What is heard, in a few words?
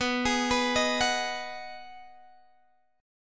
B 6th B down Guitar Open G